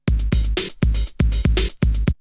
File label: Drumloop; ZERO G - Datafile DISC 1